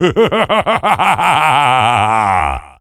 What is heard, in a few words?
Laughter